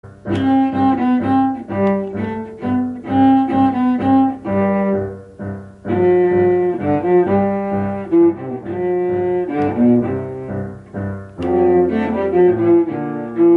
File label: piano cello duet